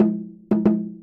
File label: djundjun, Drum loops